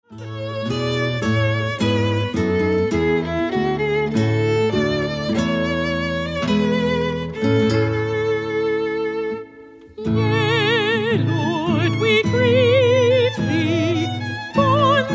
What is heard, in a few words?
O Come, All Ye Faithful (Adeste Fideles); sacred christmas